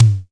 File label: Tom Low, Tama TS-206